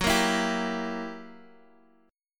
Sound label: F sharp 7th Flat 9th, down, Guitar, Half Step Down